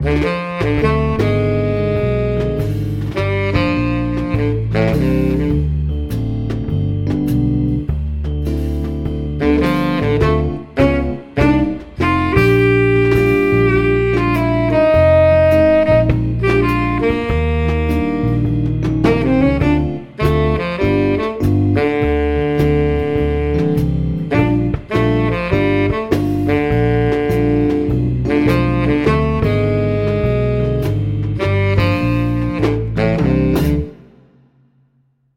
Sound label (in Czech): intro kratke tsax